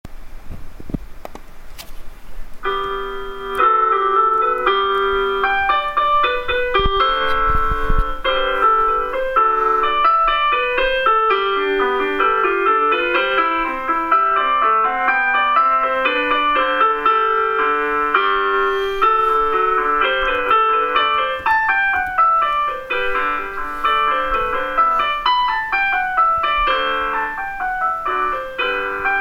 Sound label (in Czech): Sonatina G dur klavir; L. van Beethoven